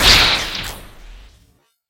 impact glitch; soundscrate

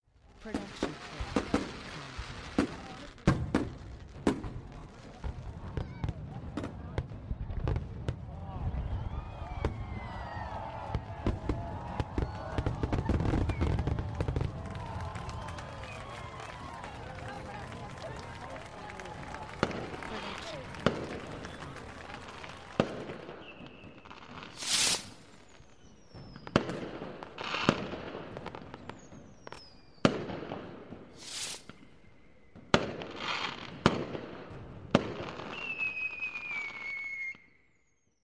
fireworks; soundscrate

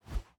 Fighting Woosh; SoundsCrate

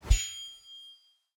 Sword Swing; soundscrate

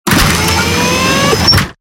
Robotic Movement; SoundsCrate

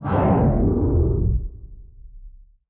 Fire Woosh; soundscrate

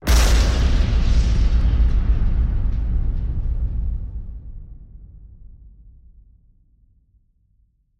anime explosion

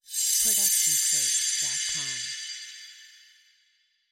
magical sheen; soundscrate

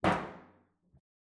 Metal Footfall; soundscrate

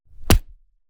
Fight Impact; SoundsCrate

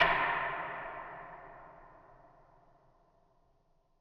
Creepy Wood Hit; SoundsCrate

